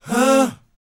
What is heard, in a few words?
BOYZ A#, Vocal Planet R&B & Dance; ILIO